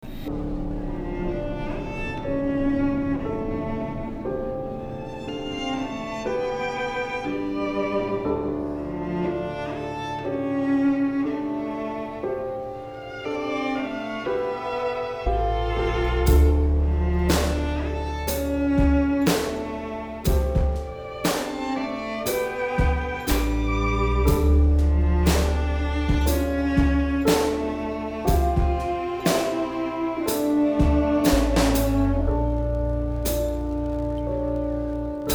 tango

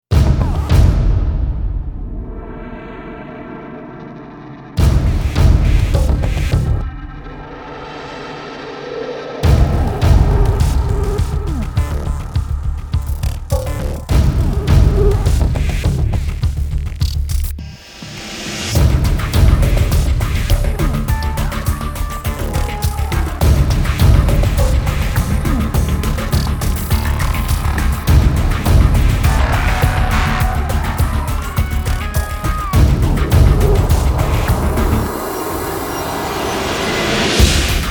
drums

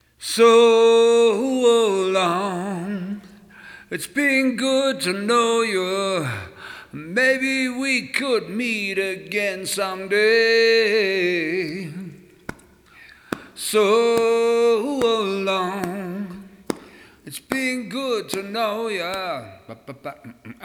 acapella acoustic; demo

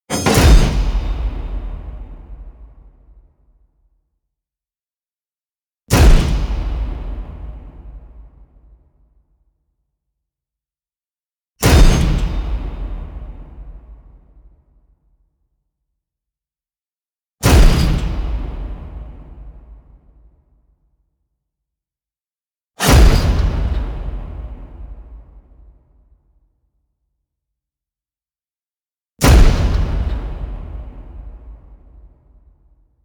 hit close the door

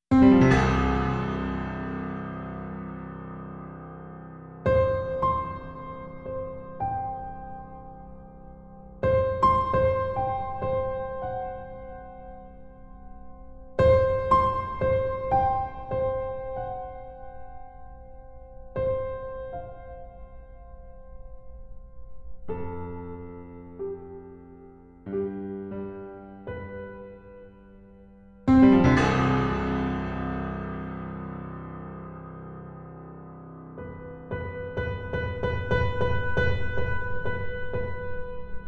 piano

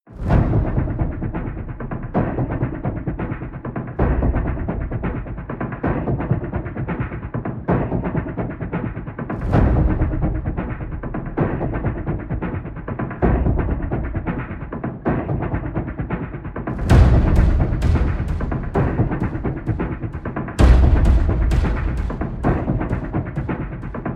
perc only

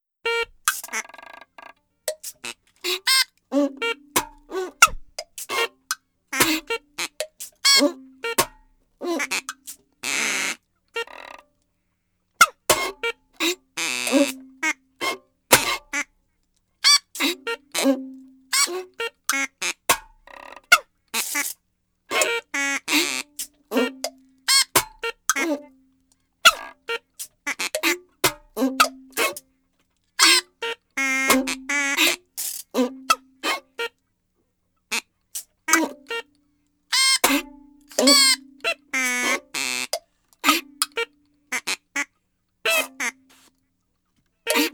acoustic version

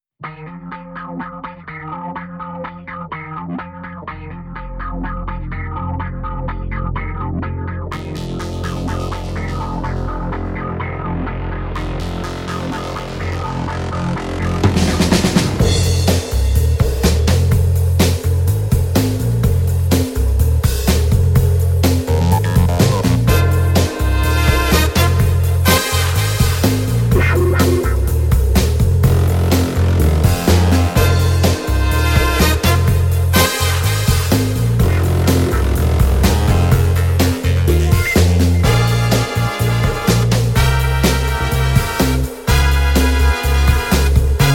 no keyboards

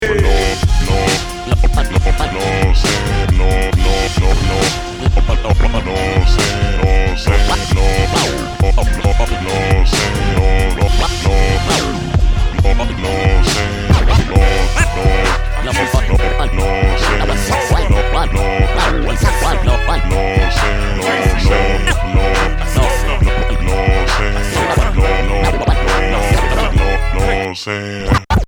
scratch break

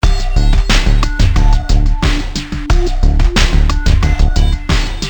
bugle

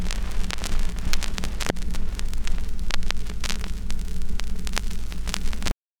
needle drop

